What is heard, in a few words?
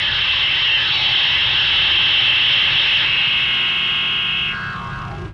Kaos FX, Ambient Grooves